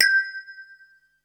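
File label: PERC 2 G5, percussion; SEQ AND PERC; Music Store - Claudius Diemeter Schwerlos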